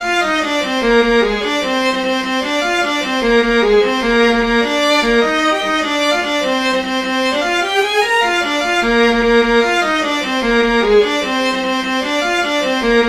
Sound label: March